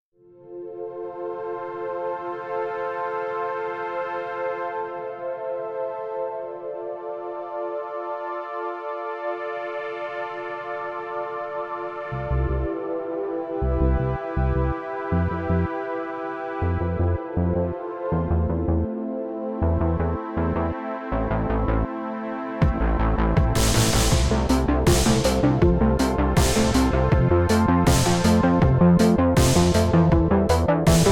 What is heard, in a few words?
renoise arturia v collection synthwave; city lights; weeklybeats-2020